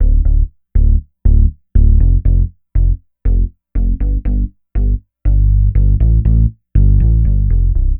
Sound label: Synth Bass; Bass X